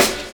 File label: HIP SAX; Univers Sons